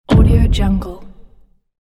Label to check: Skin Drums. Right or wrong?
Wrong